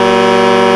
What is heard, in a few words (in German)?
lkw hupe